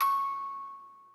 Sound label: musicbox